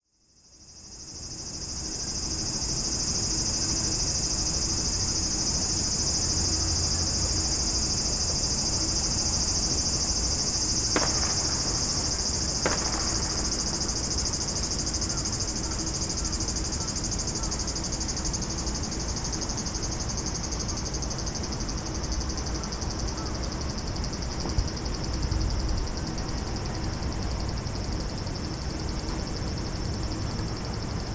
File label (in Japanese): semi no koe; Chino Cultural Complex